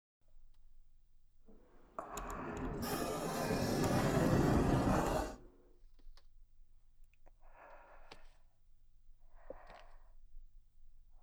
哄睡的摇篮曲; 子守歌; SisterDays――あにを癒やすのはいもうとの仕事なので?――

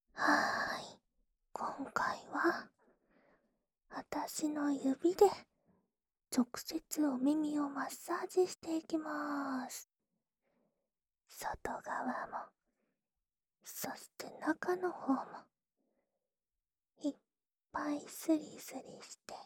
安眠用耳朵按摩迴圈（右耳）, ASMR; 耳かきリフレ『春乃撫子』

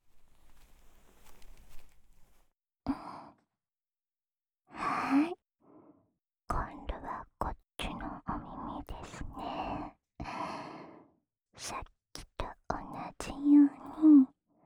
左耳吹氣, ASMR; 對深處也要搔癢癢♪; 耳かきリフレ『春乃撫子』

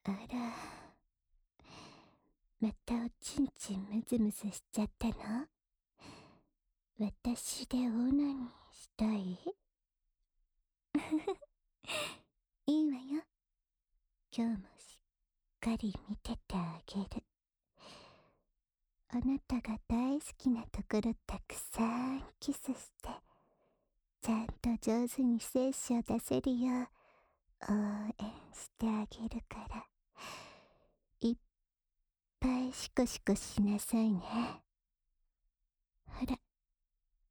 舔耳迴圈, 右耳, ASMR; 自慰支援用

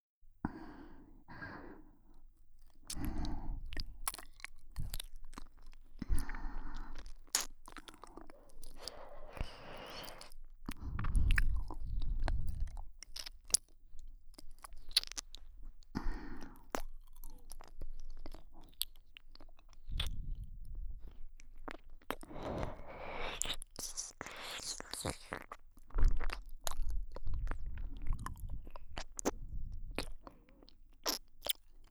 放荡姐姐的黏糊舔耳（右） only 音轨; 放荡甜蜜姐姐

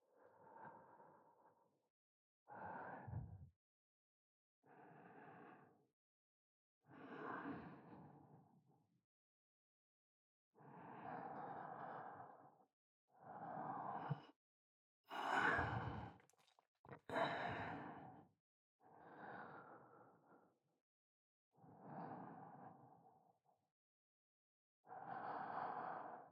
仅睡觉呼吸声（左）

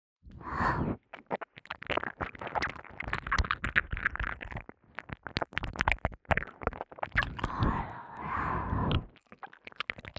舔耳 快速relorelo; 仅仅是沉溺于舔舐声中; 舔舐声特化

